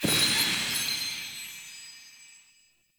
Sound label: Lore Collected Spark Burst; Objects; Energy Restores